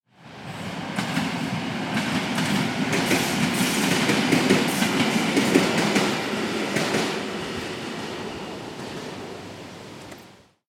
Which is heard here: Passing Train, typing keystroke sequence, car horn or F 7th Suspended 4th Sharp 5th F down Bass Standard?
Passing Train